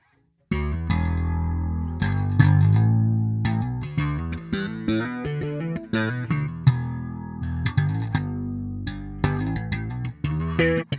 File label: Ampeg Liquifier Slap